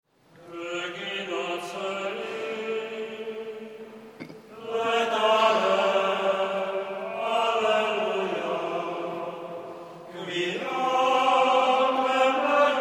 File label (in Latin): Regina coeli